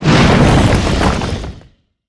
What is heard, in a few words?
Attack